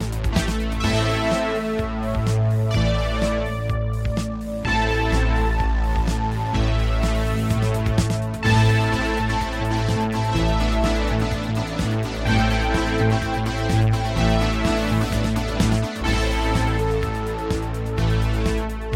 lead

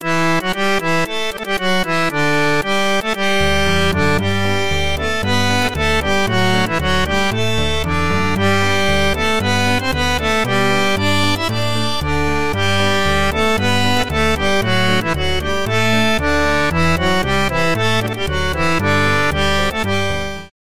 accordion

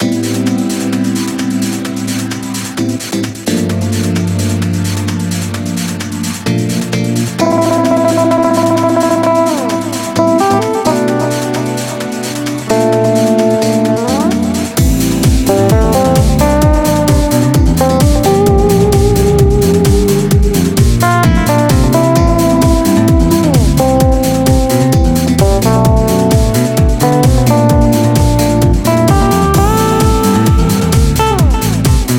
jazz; shreddage 2

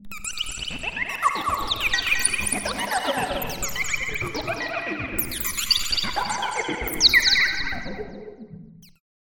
sfx subtractive alien

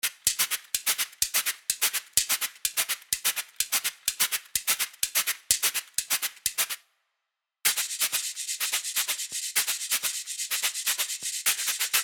solo

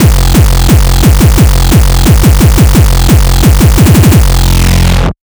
mainstream bassdrum 2, 175 bpm

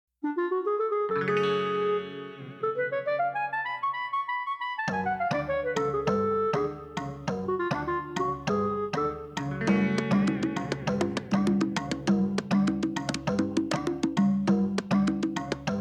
basement; black octopus sound

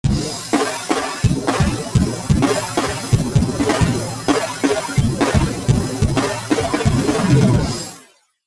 drums

